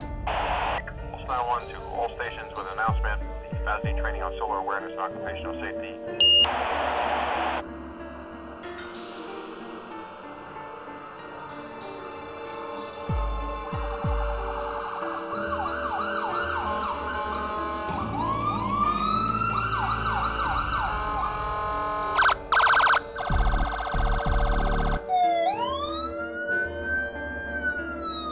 Traditional Siren; Casoundinc

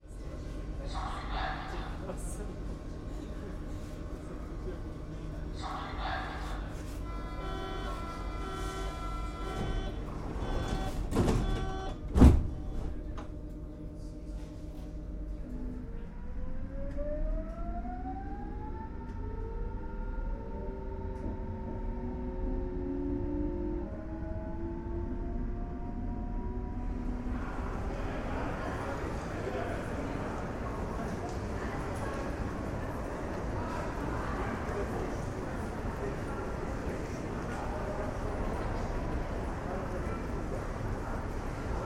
Berlin Ambiances; Loot Audio; Hzandbits

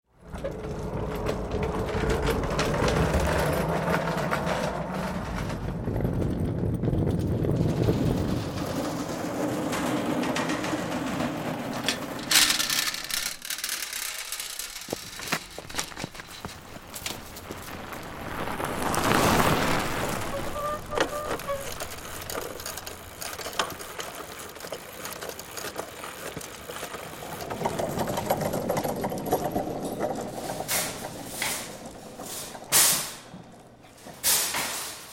Wheels; Big Room Sound

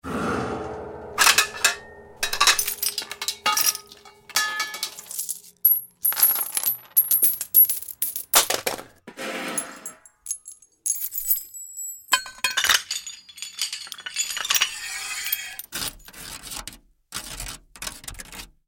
Metal Foley; Big Room Sound

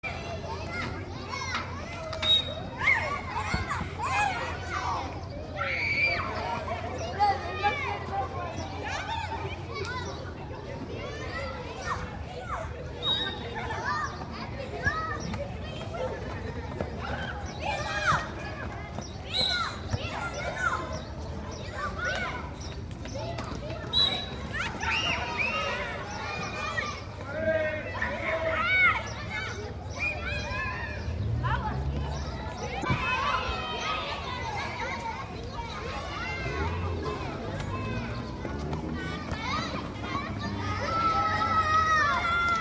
Crowd Atmospheres; Big Room Sound

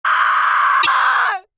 Horror; Blastwave FX; Horror Vol 3

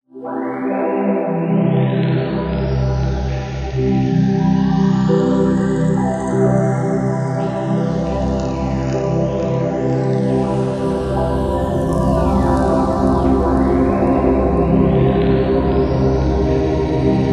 Sci Fi Atmospherics; Ghosthack

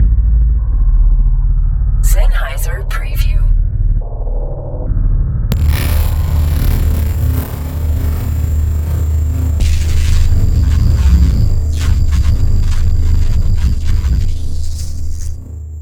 Movie Impacts; Zenhiser

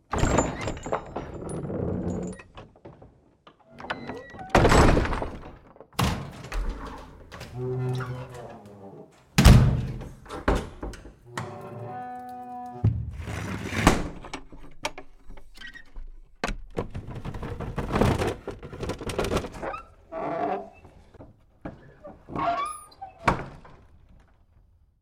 Wood Doors; Big Room Sound

